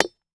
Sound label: Impact Machete Hit On Log; Impacts